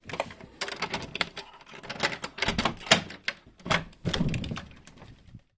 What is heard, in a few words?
door open